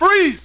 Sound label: freeze; ARCADE; NOS